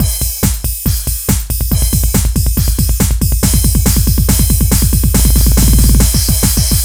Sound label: Beat 140; Audacity; loops